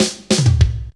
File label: Roll Format, Drum Rolls; Zero-G Jungle Warfare 1, 2 & 3; 90 s Sample CDs